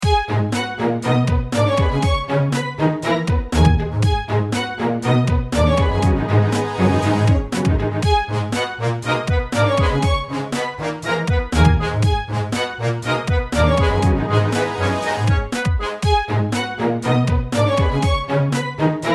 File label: instrumental